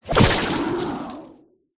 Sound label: forest keeper buff in